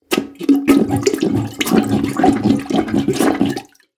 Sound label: Toilet Plunger; Blastwave FX